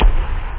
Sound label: drums bass; da capo